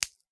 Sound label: horror bone finger snap; sound effects one